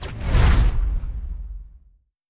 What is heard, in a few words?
imp explo